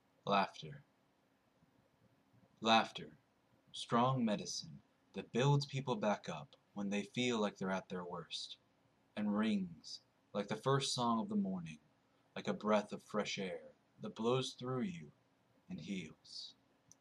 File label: laughter